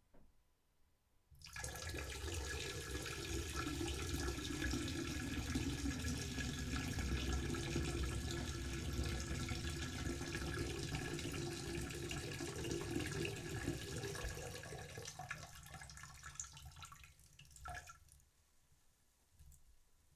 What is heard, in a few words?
Peeing